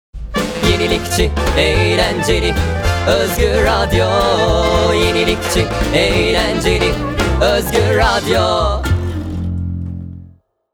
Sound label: jingle jazz